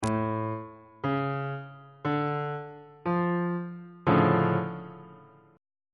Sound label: d minor major 7th 2nd inversion on bass clef